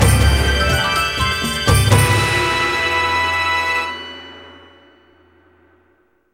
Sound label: Battle End; Lizalfos; skyward sword